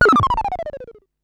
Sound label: Perc Synth Raw